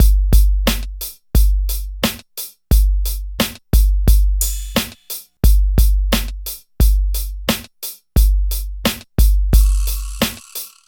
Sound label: 125 LOOP; East West - Phat & Phunky CD2; 90's Sample CDs